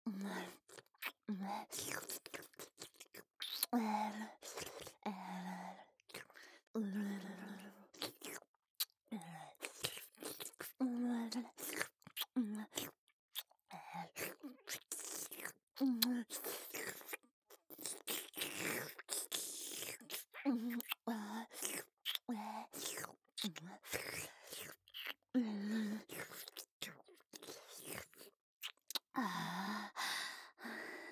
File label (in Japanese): キス; マゾハーレム